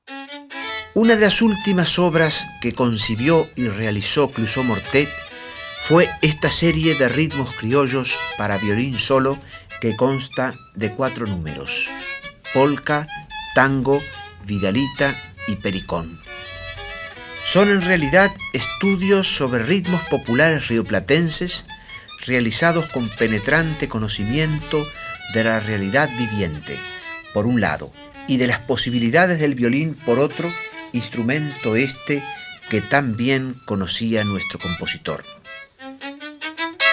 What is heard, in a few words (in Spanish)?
Ritmos criollos para violin; Luis Cluzeau Mortet; Micros radiales: audiciones de Lauro Ayestarán en el Sodre